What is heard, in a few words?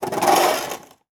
Weather Shoveling Snow On Cement Skips; AdobeCC